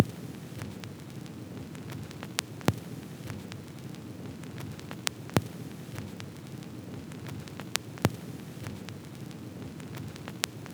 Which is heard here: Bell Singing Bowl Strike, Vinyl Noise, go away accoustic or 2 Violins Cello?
Vinyl Noise